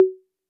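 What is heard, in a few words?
Roland808 high conga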